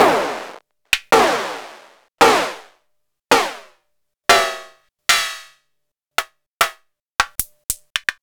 Short Hats

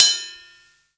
Ride set, Drum and Bass

